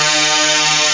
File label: jud major